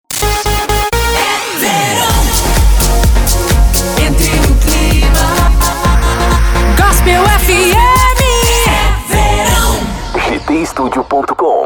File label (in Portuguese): vinhetas cantadas gospel fm